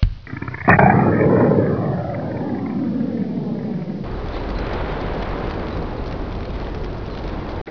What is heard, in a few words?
BIG BOOM, Explosions; sound effect